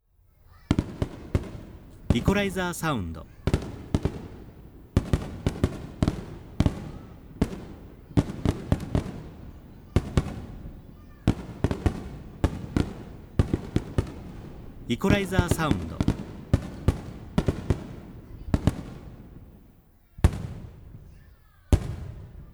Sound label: hanabi